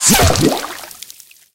bullet hit water; War Thunder

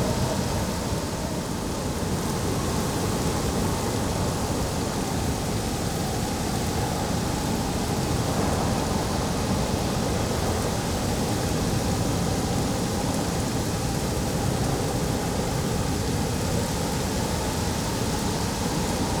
ambience arctic beach day windy rainy; Rust

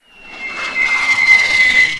mortar incoming; Explosions

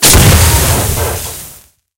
tank hit heat small

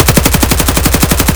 rpk16 outdoor close loop; Escape from Tarkov

